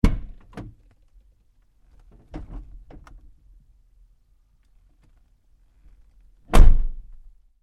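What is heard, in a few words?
Truck GMC Savana Door interior Open Close Light; Vehicles; Trucks; Big Room Sound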